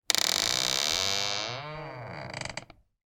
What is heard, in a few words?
household hatch door hinge creak